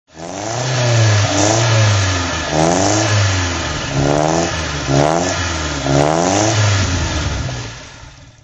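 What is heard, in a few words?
giulietta spider throttle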